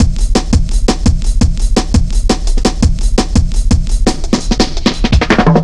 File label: 170, Drum Loops, Drum & Bass